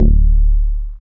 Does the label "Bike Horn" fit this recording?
No